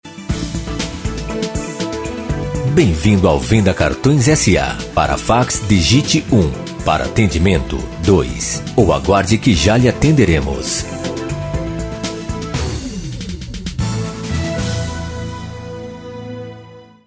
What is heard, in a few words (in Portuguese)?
espera telefônica